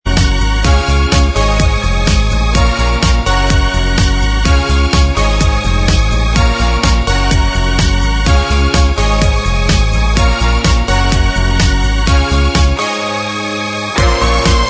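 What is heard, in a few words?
instrumental, backing track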